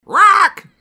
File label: Rawk, parrot